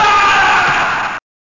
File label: AHHH; Parrot Island; Amiga